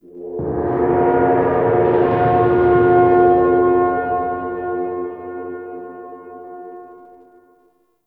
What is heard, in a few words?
Officer Stinger; Interrogation; Dax